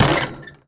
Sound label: Street Ball Glass Rim; SFX Kit